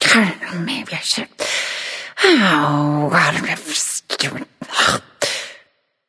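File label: grumble, female; smite; voicepack; hera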